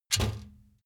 trash basket metal hit rattle

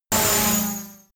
sci-fi laser shot

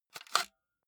cassette out of walkman

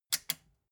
metal button click power on fast

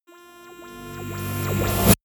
rise buzz medium

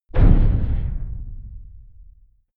big metal impact low soft

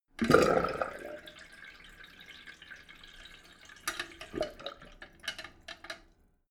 sink drain hard short

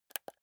console controller joystick press

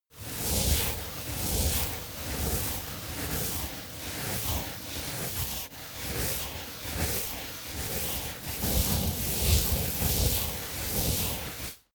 balloon scrub hair circling

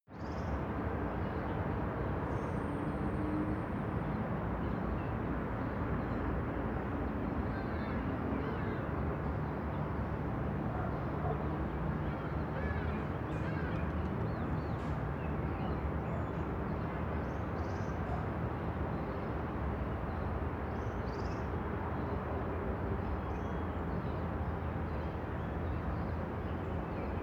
morning traffic distant

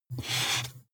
marker mark soft short

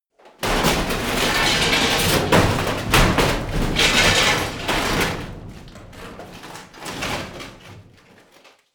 metal crash medium

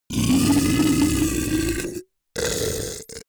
beast choke

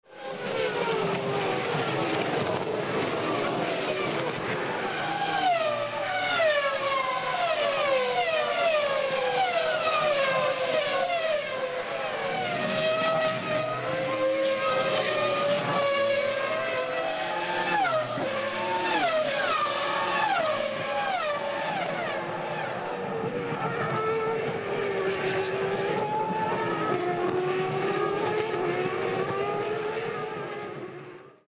f1 race pass

